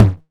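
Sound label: CLUB high tom, CLUB KIT; Wizoo - Platinum 24 Electronic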